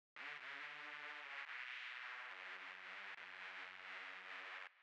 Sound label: Synth; ODGPROD